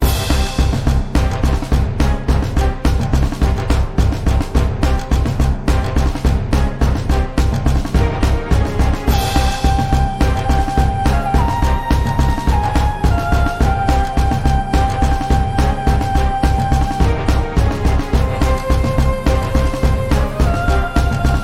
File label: Dungeon, bgm